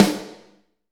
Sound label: SNR, Drumscapes Roland; Northstar - Drumscapes Roland